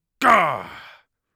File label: angry, Mage Voice Pack